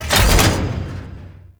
Rail Jack Weapon Switch Two; Warframe; Vehicles

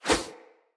Hunter Bow Melee Arrow Whoosh; Weapons; Player; Bows; EnvoyHunterBowRangedMelee

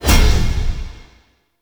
Rally Cast Peak; Warframe; Hoplite

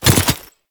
Brood Cast Stop; Warframe; Oraxia

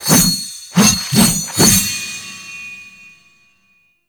Sword Finisher; Teshin

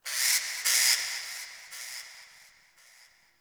Ext Texture Bird Small; Ambience; Misc Outdoor; Warframe; Infested Eris

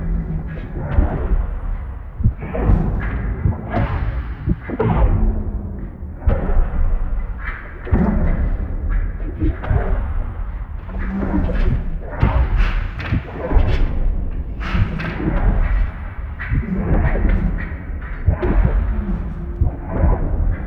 Vault Heart Beat Broken Loop; Ambience; Gameplay; Warframe; Infested Eris

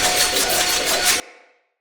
Saw Dagger Throw Loop, Weapons; Player; Swords

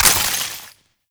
Ammo Pickup Shock; Ammo Ability; Warframe; Warframes; Frumentarius

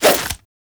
nunchuck end whoosh; Warframe; Infested; weapons

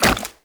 Wall Jump Goo; Footsteps; WallRun; Warframe; Characters; Tenno

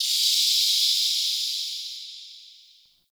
Relay Steam Blast; Warframe; Ambience; Hub; Tenno Junction Relay

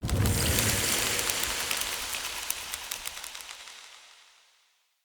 Bow Butterfly Dissolve; Weapons; Player; Bows; Fey Thorn Bow

